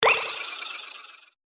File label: Spin Button; Candy Bona